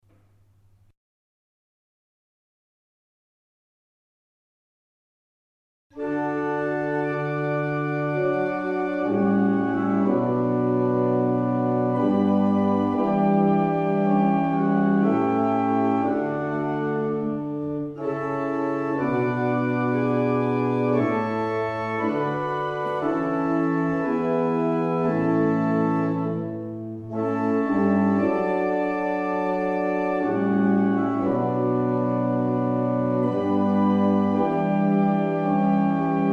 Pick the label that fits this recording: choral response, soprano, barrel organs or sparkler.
barrel organs